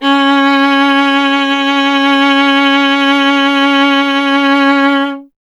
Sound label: viola C; Miroslav Vitous; Solo Instruments VOL-1